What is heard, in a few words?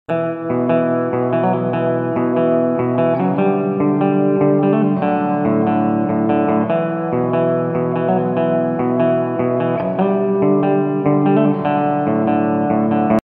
clean electric guitar riff